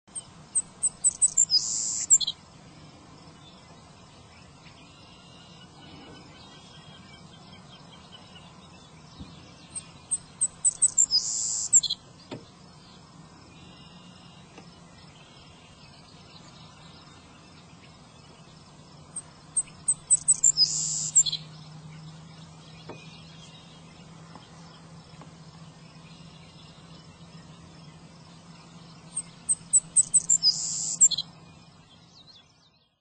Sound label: Savannah Sparrow; Birds